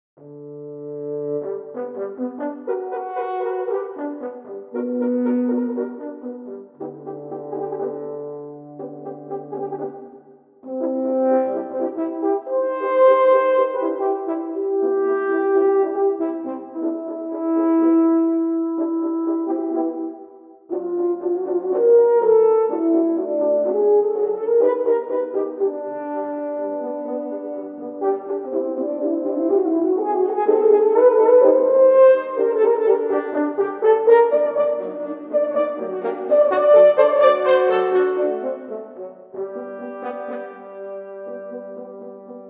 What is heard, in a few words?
Natural Horns